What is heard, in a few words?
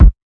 lofi kick